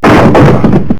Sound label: crate shatter; blobAndConquer; combat